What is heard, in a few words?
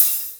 hihat open